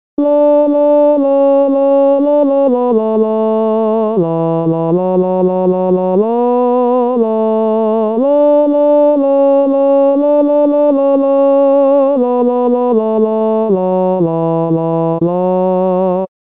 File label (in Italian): tenori